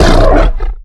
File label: giant take hit, mutants; STALKER; customnpcs